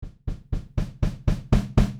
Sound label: acoustic